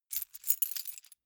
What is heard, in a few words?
key ring large rattle soft; objekte; schluessel